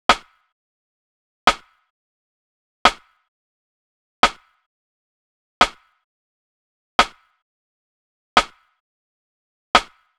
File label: Drum Loop - 87 BPM Rim, Boom Bap; Frozen; Cymatics - ANALOG EVOLUTION Beta